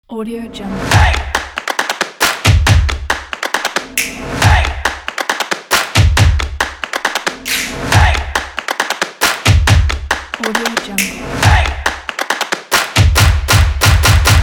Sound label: stomps; blueeagleaudio